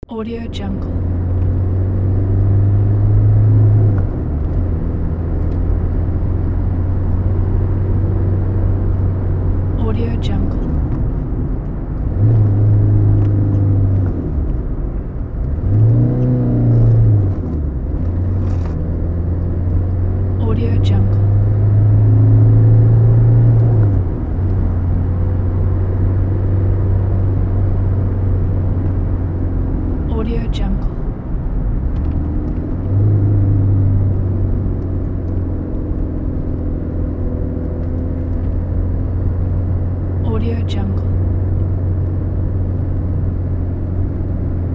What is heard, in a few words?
car driving interior